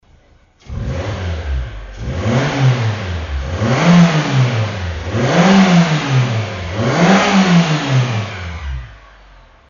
Mazda2 Demio Muffler Audio; AutoExe

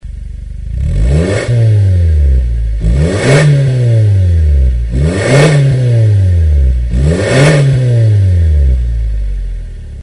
Mazda5 Premacy CW, Muffler; AutoExe

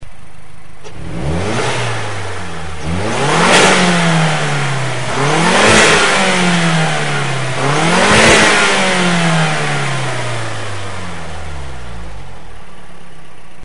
Mazda6 Intake sound; AutoExe

